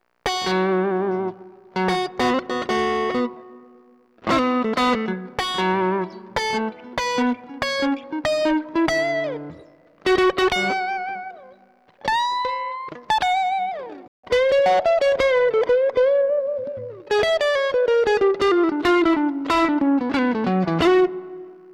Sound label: guit